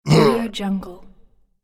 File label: zombie hurt